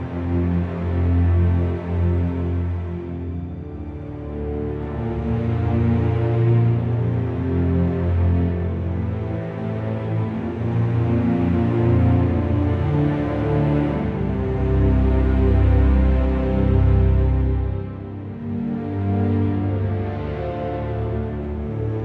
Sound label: Loop Music; Vitruvian Seq; Warframe; Cinematics; The Sacrifice; Sacrifice Quest